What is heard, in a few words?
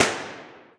gun shot